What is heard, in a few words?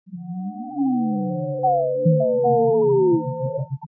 line 2 sine